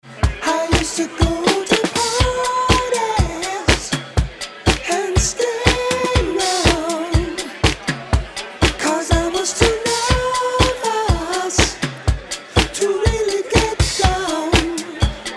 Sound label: perc and vox